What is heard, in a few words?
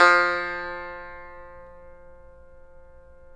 TAR; Roland - LA Composer vol. 3